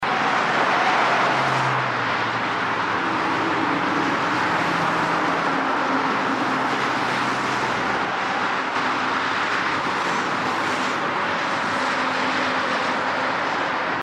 Highway close; A Description of Sound and Its Qualities

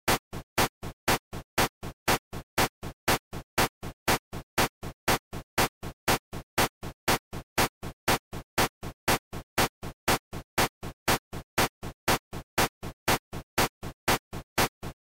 Two beat fast, rhythm; music

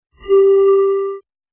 PanPipe fundamental; music

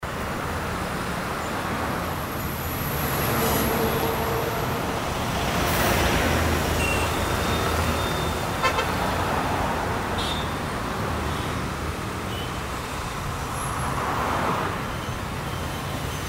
Traffic Noise

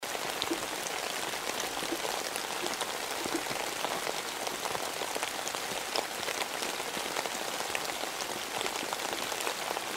Rain Sound

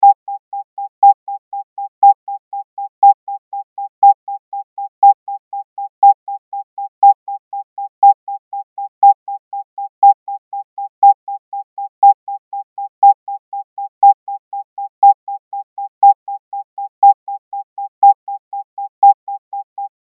800Hz 3 beat per sec; music; rhythm

